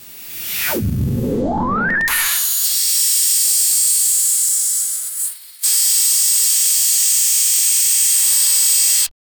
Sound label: advanced human built spac, spacecraft